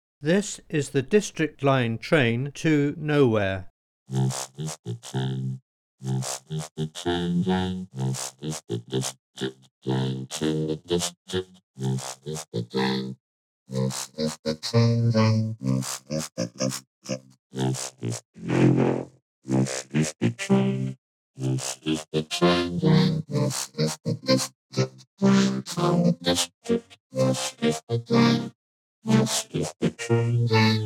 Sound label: Lisping Vocoder